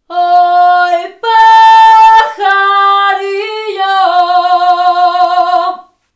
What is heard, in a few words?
Slime